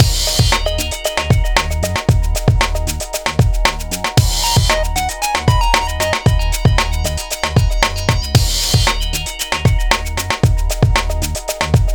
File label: 115bpm Blip Breakbeat